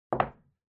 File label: Kraft Tape Put Down